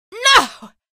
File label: pain, female; episodic; episode 1; Source engine